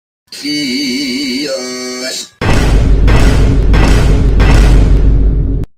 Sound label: vine boom sound